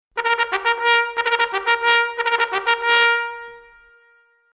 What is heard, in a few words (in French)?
Sonnerie Appel Des Fourriers